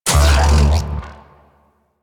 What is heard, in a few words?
Mech Start; Nechro Mech; Vehicles